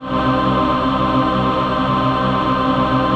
VOICE PAD, ATMO PADS; Best Service; XX Large

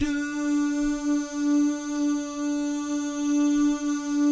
"doo" guys, Vocal Jazz Fx

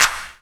CLP HOP; E-Lab; X Static Goldmine 2; akai

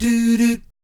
"DOO DOOT", vocal jazz fx

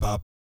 "bap", Jazz Fx, G#2; Spectrasonics; Vocal Planet Vol.3 Jazz Fx

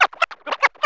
SCRAT, VNL FX; E-Lab; X Static Goldmine 2; akai

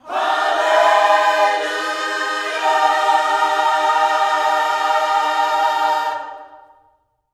HALLELU, Gospel

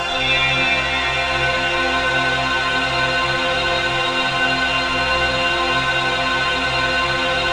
ATMOPAD; Best Service; XX Large; Pads

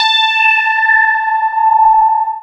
SWIRL; E-Lab; X Static Goldmine 1

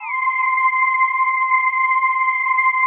PAD, ATMO PADS; Best Service; XX Large

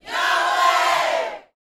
"yahweh", gospel shouts; Spectrasonics; Vocal Planet Vol.1 Gospel; akai; UYC SHOUTS

